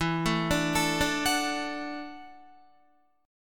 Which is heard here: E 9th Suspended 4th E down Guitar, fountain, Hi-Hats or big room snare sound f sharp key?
E 9th Suspended 4th E down Guitar